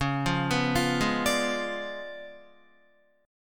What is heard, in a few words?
Db 7th Flat 9th, Guitar; Db7b9 arpeggiated; JGuitar